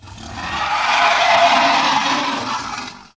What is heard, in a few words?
WARN